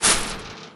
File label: chainlink, footsteps; player